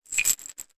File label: med bottle